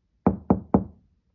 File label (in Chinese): 敲门声